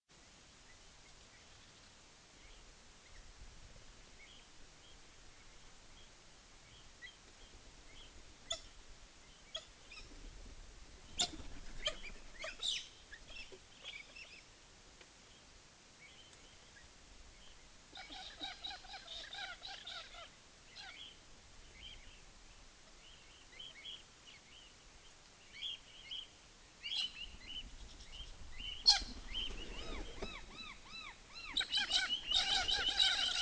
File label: Cockatiel Nymphicus hollandicus